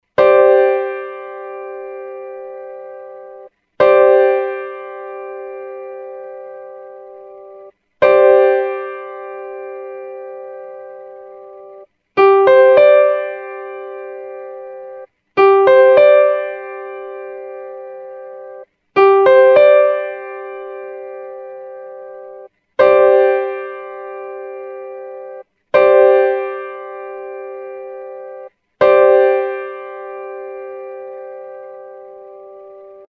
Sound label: Gsus4 Piano Chord